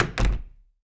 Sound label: Closet Close